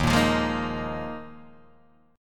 D sharp Suspended 2nd Suspended 4th D sharp Guitar Open D